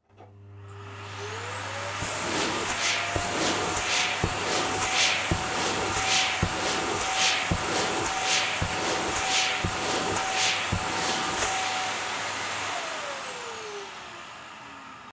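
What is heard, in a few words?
vacuum cleaner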